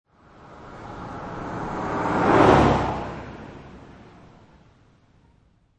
Car Driving By, Regular car drive by on small road; Auto, car